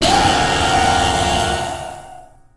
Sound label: jumpscare; flumpty bumpty